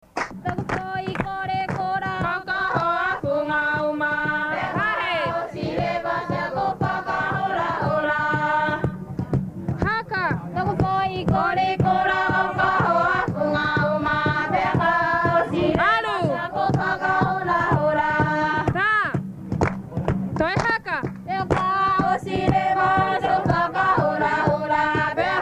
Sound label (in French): mua choeur